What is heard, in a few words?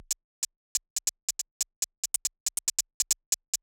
hat